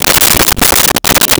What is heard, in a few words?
Write on Paper with Pen; Office Supplies; 1001 Sound Effects